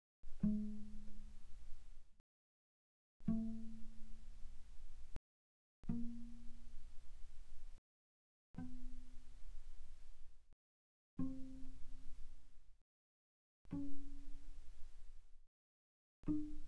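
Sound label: Cello pizz pp sul G G3 G4 stereo, pizzicato; Strings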